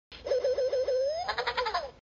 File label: bird call; FooBirds